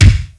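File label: kick, funk kits; Crypton - DrumBase5000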